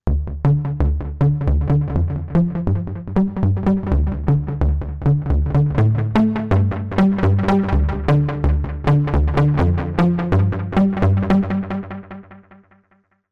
Tubesynth Busy Woodpecker

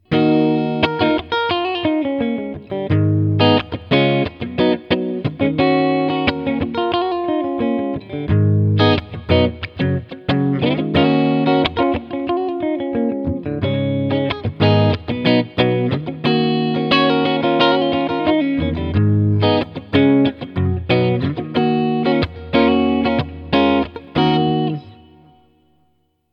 Clean EL84

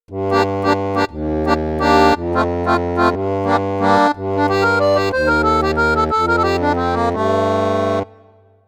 Yamaha Akk

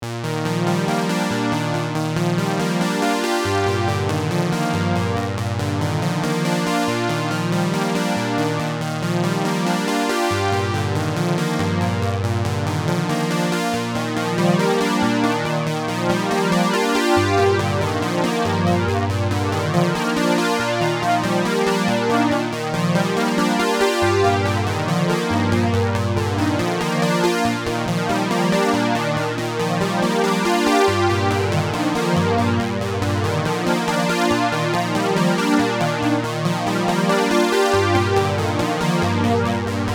Juno60 Chorus

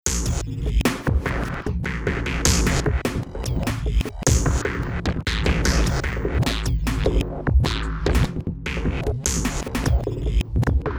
NI Battery 4 exile kit

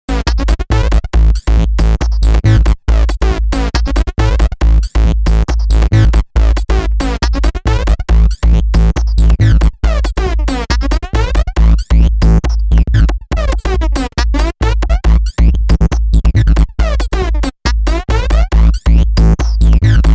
stranger flanger

